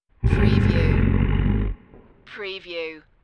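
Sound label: Beast Growl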